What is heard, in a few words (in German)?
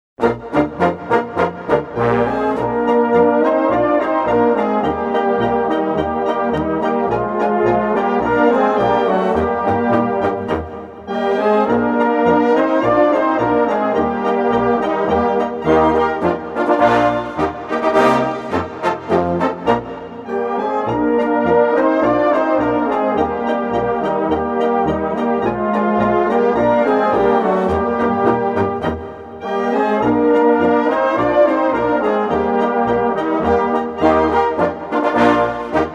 Polka